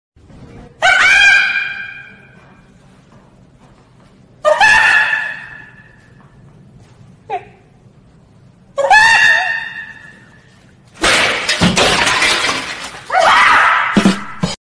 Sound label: sound of laughter